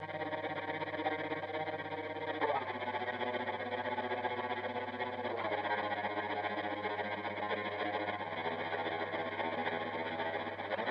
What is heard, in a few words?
Jelli Guit